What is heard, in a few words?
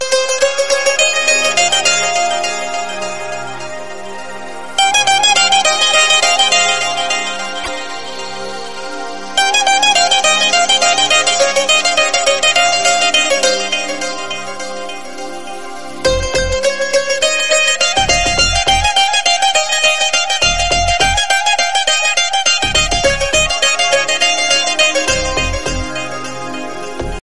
loud guitar; ringtone